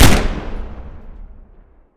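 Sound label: mp5 unsilenced, gun; arccw go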